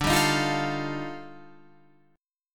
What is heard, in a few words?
D sharp Major Sharp 11th, D